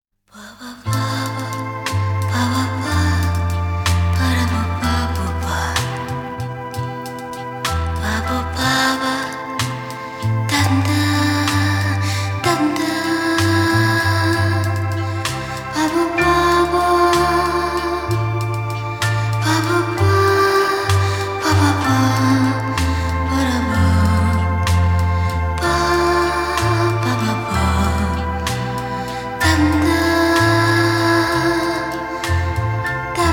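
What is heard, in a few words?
Singing guitars vocalise, Nocturne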